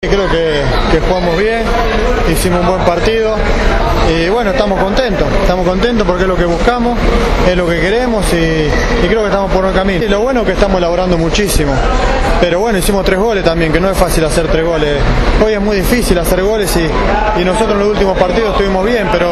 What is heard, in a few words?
ferrari